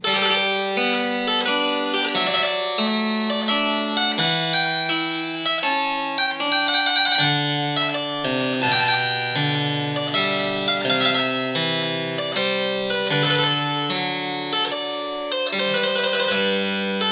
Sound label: French harpsichord; Henry Purcell, Ground in gamut Z 645; Three Centuries of Harpsichord